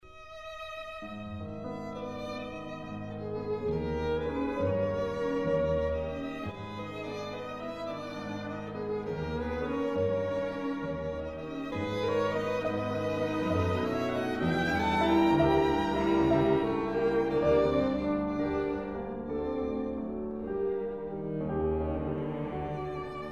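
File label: piano quintet; kiel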